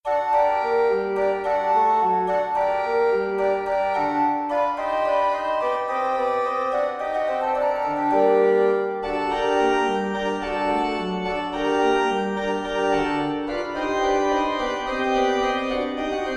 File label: Organ Italian Air in G Minor